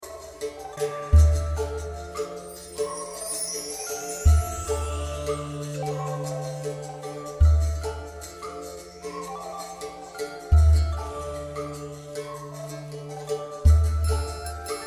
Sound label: UDU Funk Mixture